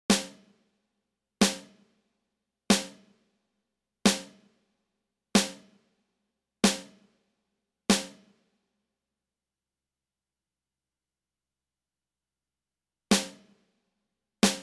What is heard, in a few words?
Seven Snare Drum Beats Across the Sound Stage